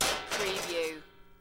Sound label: Metal Drag Scrap Clanking